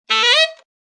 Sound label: A saxophone roll.; Horns